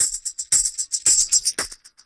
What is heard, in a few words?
LOOPS